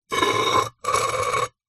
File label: household pot ceramic base lid plate scrape